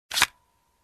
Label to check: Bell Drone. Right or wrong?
Wrong